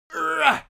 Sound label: creature imp hit